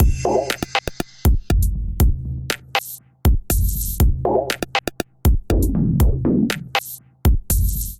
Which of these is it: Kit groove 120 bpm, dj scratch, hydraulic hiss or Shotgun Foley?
Kit groove 120 bpm